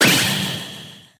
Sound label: ninja star puck hit